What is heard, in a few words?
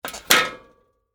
Sports, Bicycle, Kick, Stand, Up, Vintage; Schwinn; Loop; Bicycle Sound Effects